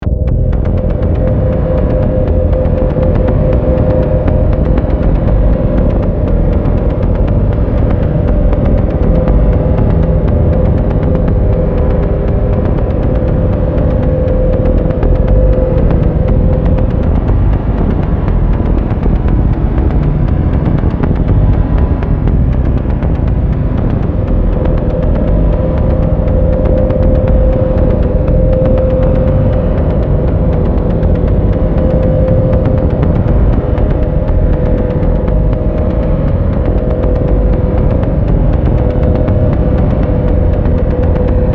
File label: drone in the machine